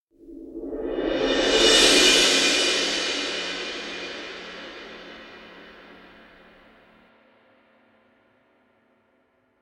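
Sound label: Crescendo Cymbolic; Brand X Music; Brand X Music Catalogue - Editor's Essentials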